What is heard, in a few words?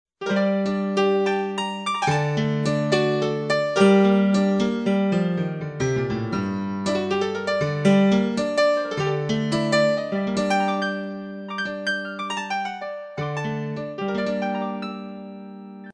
piano